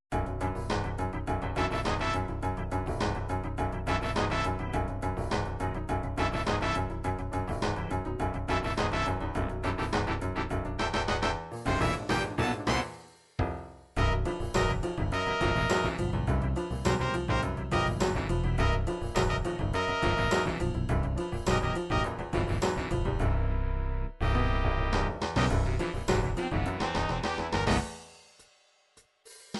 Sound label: dance funk, midi